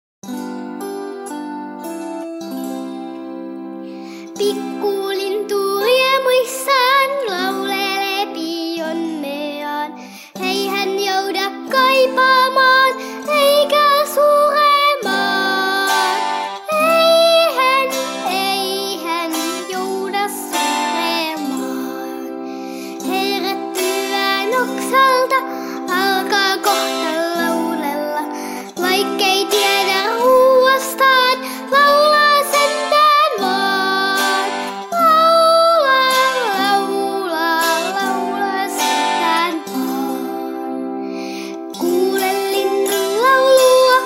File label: laula song